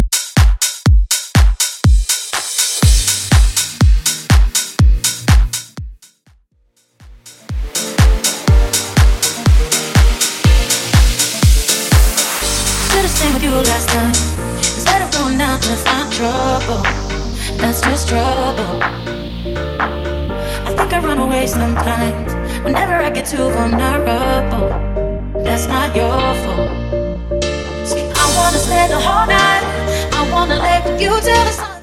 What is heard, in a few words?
Deep House Quick Mix 122 Bpm; Vegas Edit